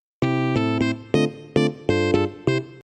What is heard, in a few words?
jingle